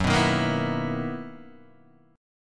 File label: B Major 11th Gb